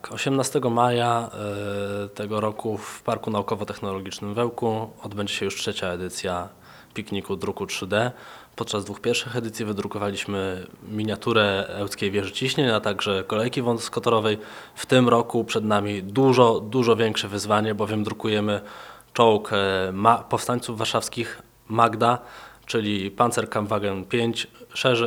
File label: techno